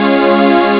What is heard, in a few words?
roll; Amiga Soundtracker Sample Packs